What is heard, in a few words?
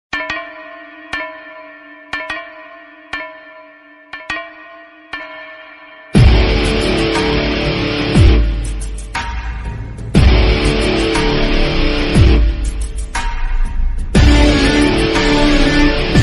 bgm ringtone tamil ringtone; Dilli bgm; Kaithi